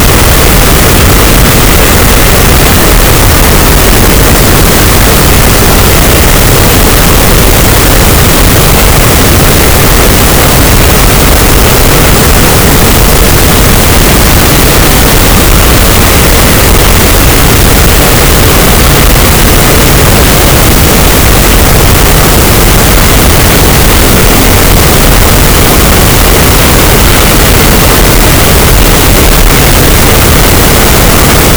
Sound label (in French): cachalot, gaussian noise; Physeter Macrocephalus